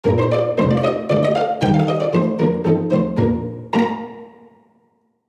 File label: Jingle Pizzicato; BPM120, 4/4, Bb, Pizzicato